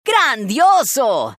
jazz; rocktopus

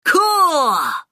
rocktopus sample jazz

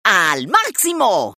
rock; rocktopus

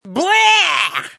eat incorrect; codeapillar

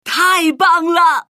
rocktopus sample jazz

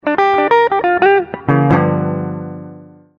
Guitar Solo; rocktopus

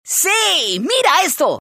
rock; rocktopus

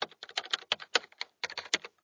keyboard typing

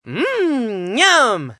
correct response; codeapillar

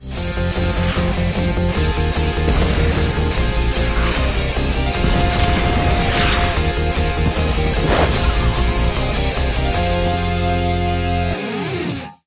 Motivational - Explosions and Gearing Up

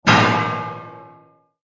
Metallic Bang

